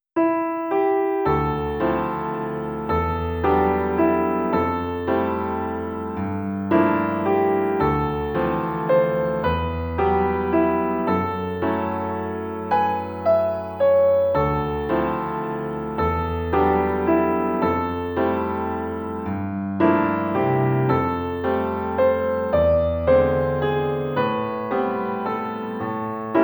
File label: Instrumental; Toho Complete Box; Touhou Silver